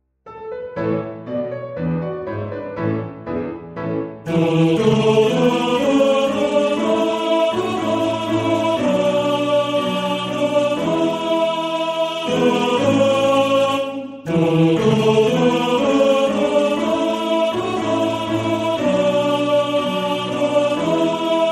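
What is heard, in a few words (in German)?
Soldateliedli A Tenor 1, Männerchor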